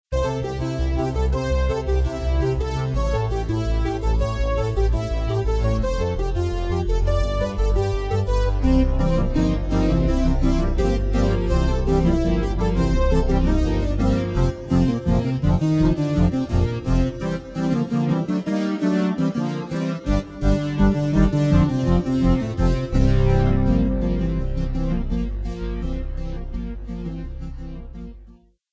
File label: electroblues